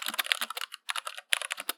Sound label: keyboard typing